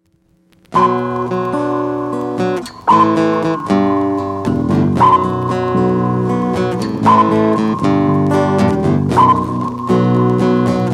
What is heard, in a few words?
bomba ringtone